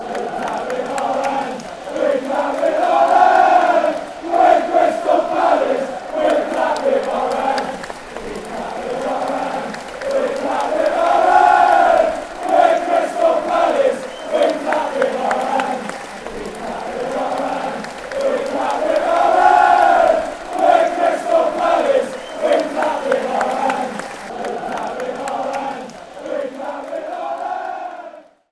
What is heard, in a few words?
we clap our hands, chants